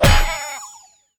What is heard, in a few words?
sheepy terrain impact; sfx